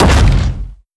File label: step roof; creatures; strider; seq passby